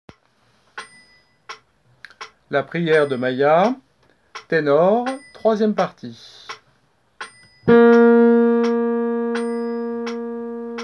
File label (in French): ténor